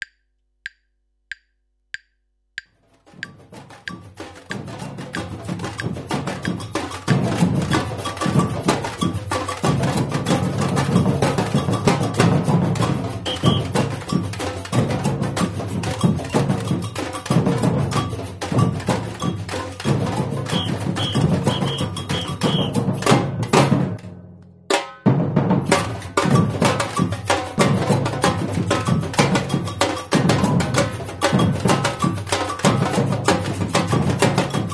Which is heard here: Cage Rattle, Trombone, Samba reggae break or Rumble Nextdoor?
Samba reggae break